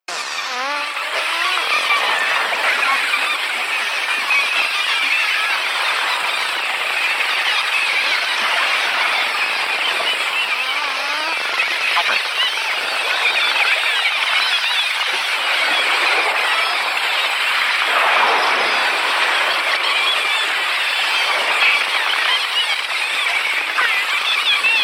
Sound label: dolphins at Cordell Bank